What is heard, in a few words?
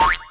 whiff hit; HeavenStudioPlus; dress Your Best